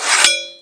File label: knife deploy; l4d2; talos axe; weapons